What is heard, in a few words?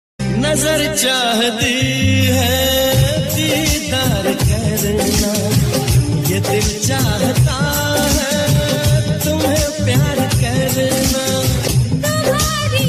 Love Hindi Ringtone